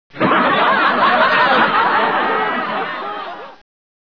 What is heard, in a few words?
knife cheer; cstrike